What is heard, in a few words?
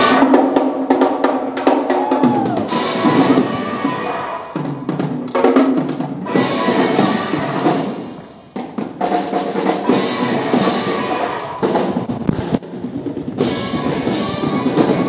drummers announce the bride and groom; high impact